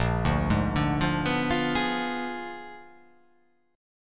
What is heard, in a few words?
A sharp 7th Flat 9th A sharp down